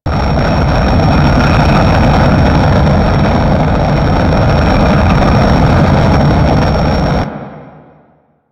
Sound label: Archwing movement turn low loop; Warframe